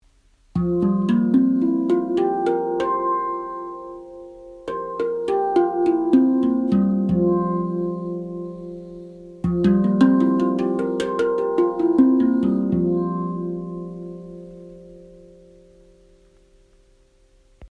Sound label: handpan spacedrum melog selizir